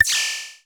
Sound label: PLINK TWIT, Prophet Five; E-MU Formula 4000 Series Vol. 3; Analog Odyssey